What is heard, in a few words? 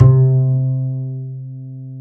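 double bass C3, Jazzistic; Univers Sons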